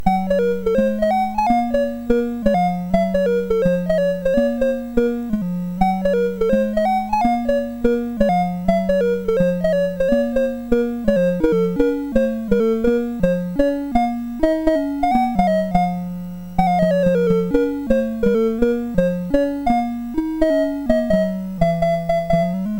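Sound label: Nutcraka, MOS 6581 SID chip music, games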